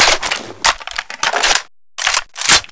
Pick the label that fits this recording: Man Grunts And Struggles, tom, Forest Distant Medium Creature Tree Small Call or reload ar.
reload ar